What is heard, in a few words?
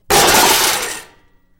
Metal Debris Impact Settle